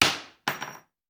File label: Bullet Shell; Sound Ideas; Guns